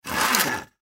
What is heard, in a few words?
Saw Wood Single Stroke Cut; Saws; Machines; Industry; Blastwave